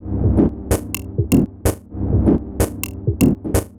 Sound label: Orbit 127bpm, House Drumloops; 90 s Sample CDs; Zero-G House Fabrik Drumloops; ZG Drumloops